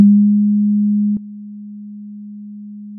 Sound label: pop, Sound of a church bell